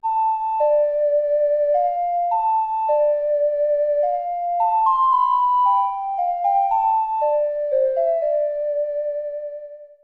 Ocarina; The Legend of Zelda: Ocarina of Time; N64

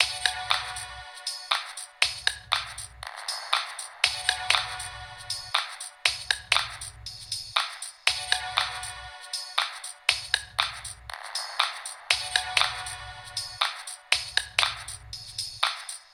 Tv Music

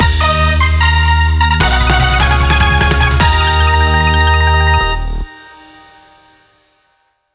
Clear Fanfare; ape escape 3

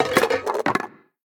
Cans Jump Scare; The Inn

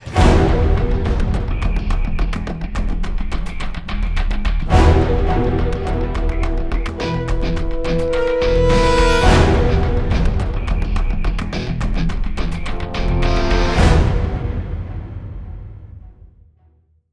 Round Start; Universal

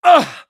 Damage Male; gamerip; Car Simulator 2; android

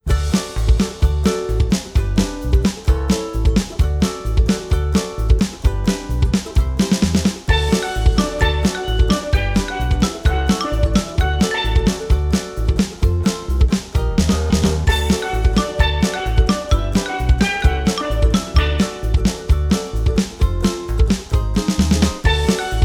Bonus Level; rupert and riley shipwrecked

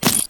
Mine Down

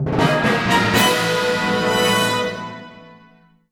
Clear Fanfare; Sky Temple

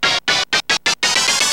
Laser Hockey - Intro; Wii Play

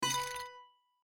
Chip Destroying; My Cute Commissar

